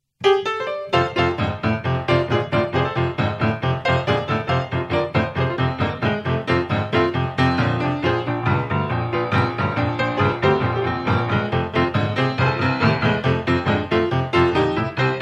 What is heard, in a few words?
Boogie